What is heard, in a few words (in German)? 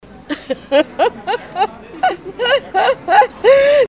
LAACHHHHHHH